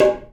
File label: DRUM; E-MU Producer Series Vol. 5; 3-D Audio Collection; 3D Multis; 3D Sprint Select 2